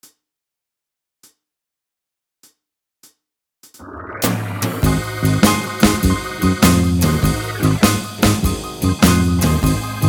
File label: backing track tenor, saxophone